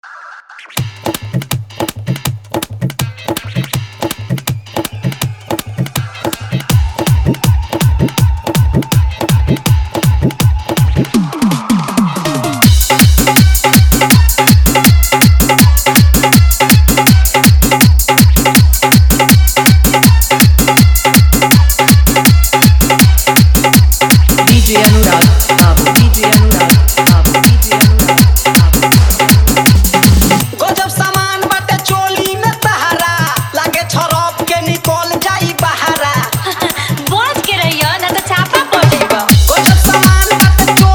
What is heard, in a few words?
Dhollki Bass Mix